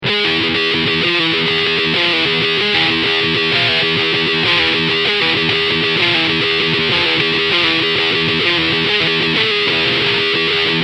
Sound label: brutalo Metal